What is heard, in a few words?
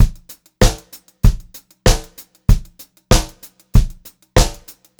BEAT, Pop-Rock Drumloops; USB Soundscan vol.37; AKAI